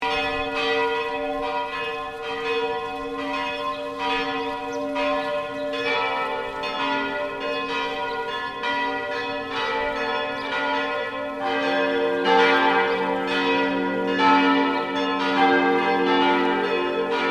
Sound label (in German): Glocken alle